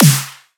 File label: Dubstep Snare; Ghosthack; Dubstep Sound Arsenal